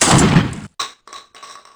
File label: SPAS12